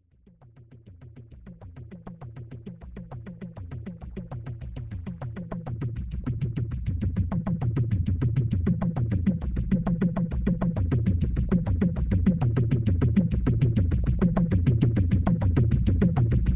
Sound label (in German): Trommel und Vogel